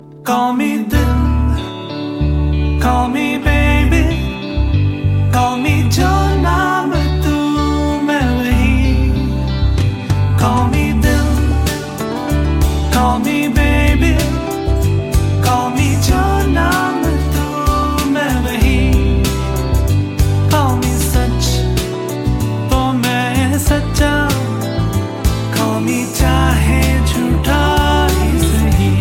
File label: love call